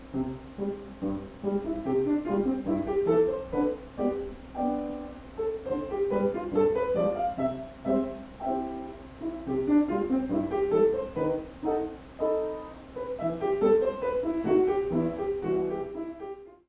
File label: Pizzicato; Pizzicato Polka from ballet "Sylvia" by Delibes